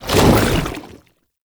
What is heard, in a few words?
Chem Strike Goo Layer, Footsteps; Enemies; Foley; Warframe; Lasrian Soldiers; Lasrian Chem Strike Nox